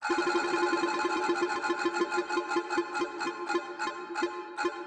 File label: reels rotation